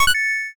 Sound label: notify follow